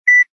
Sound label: Low Beep Once; LG VX5500